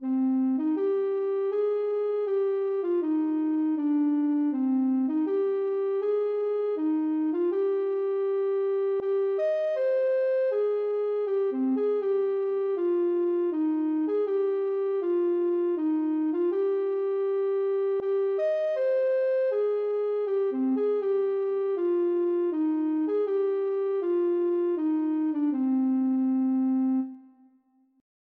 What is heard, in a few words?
ocarina